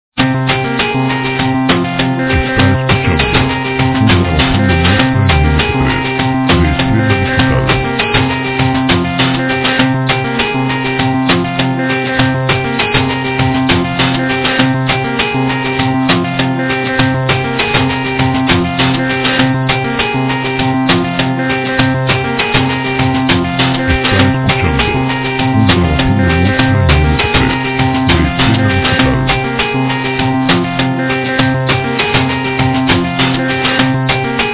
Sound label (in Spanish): tensos informativos; otrosgenerosmusicales